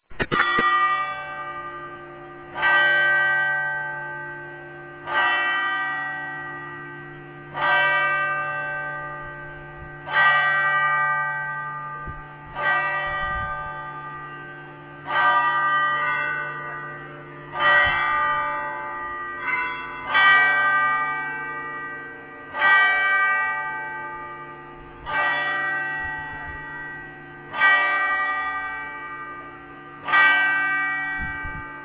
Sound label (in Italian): Campane